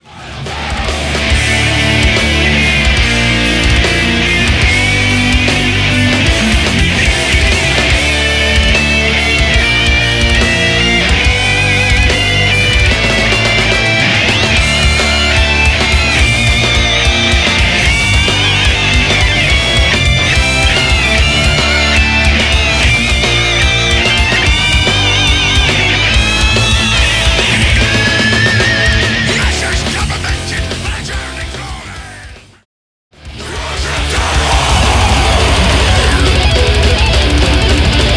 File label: guest guitar solos